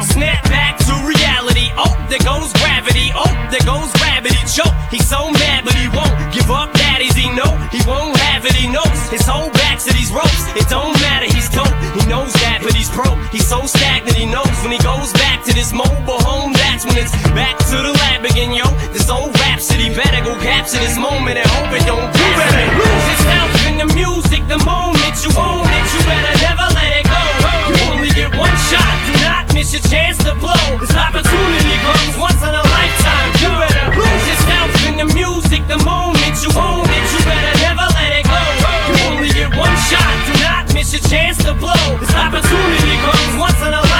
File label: song; tfc